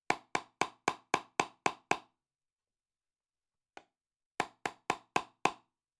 Hammering A Nail Fast Hits

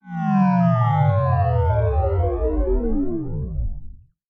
No Battery

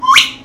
Fast Ascend

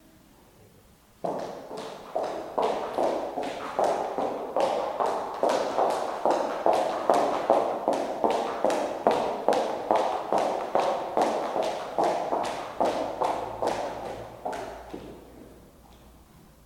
High heels quick

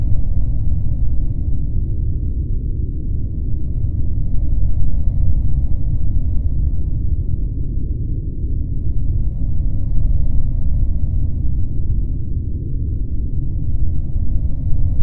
Starship Rumble

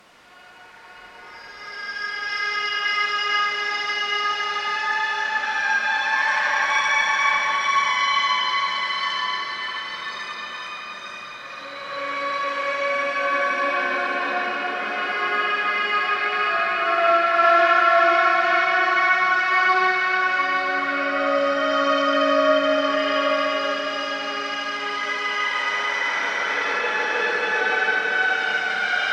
Haunting Violin

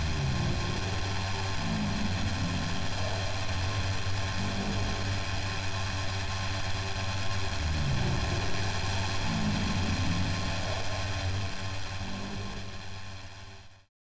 Static

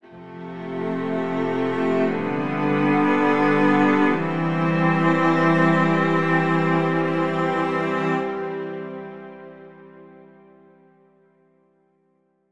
Harmonious Outro

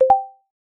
Notification Sound

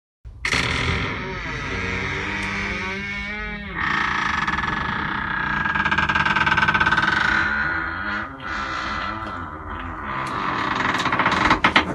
Old Creaky Door

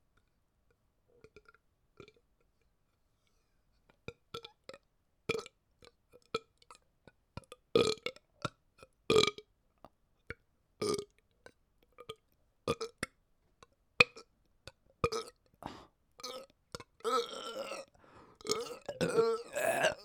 Crescendo Burping

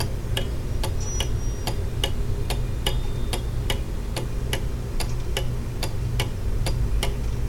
Clock Tick Loop

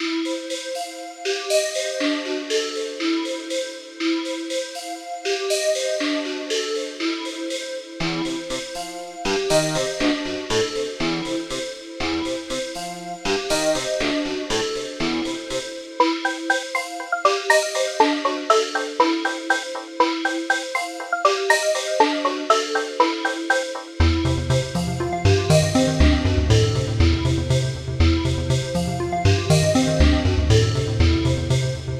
New Age Tune

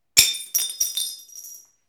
Breaking Glass

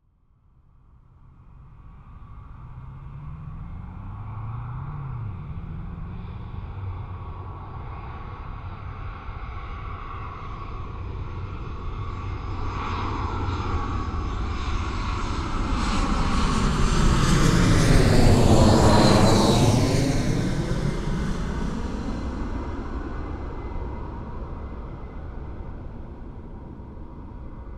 Plane Flyover Landing

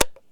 Light Switch

